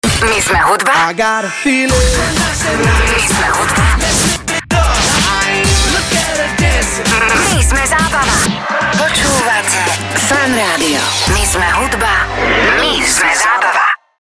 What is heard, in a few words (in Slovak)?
jingel